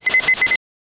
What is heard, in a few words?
futuristic binary code; computer